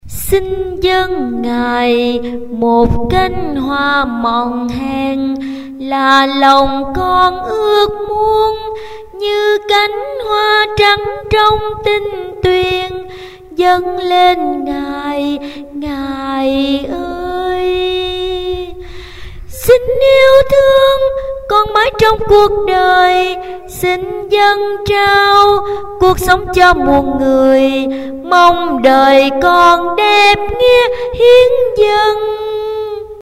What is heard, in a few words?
tenor basso